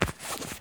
deagle cloth; new weapons; deagle tiger; cstrike

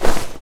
galil deploy; new weapons; cstrike

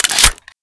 Deagle Clip Out; new weapons; deagle tiger; cstrike

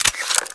clipout, weapons; cstrike

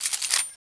elite deploy, weapons; cstrike

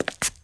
pl jump; cstrike

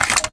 boltback; weapons; scout; new weapons; cstrike

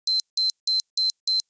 c4 beep; weapons; cstrike

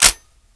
famas clipin, weapons; cstrike

